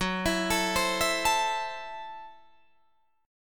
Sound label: Gb Minor 7th Flat 5th, Guitar Drop D